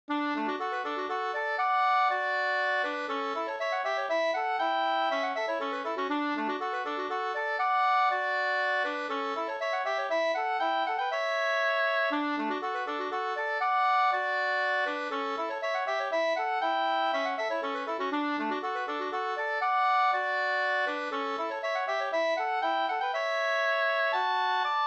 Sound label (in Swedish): polska Bingsjopolska; Kronprinsen 2st